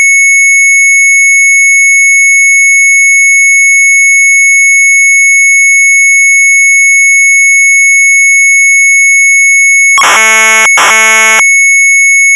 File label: MZ800 Tape; sharp; New Gambler 2; retromelsarchive